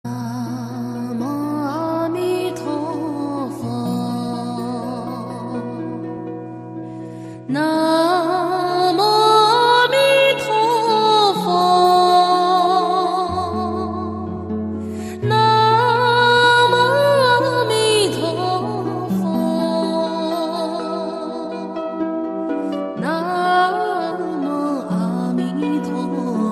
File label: Na mo o mi tuo fo, 432hz; Nhạc-Niệm-Phật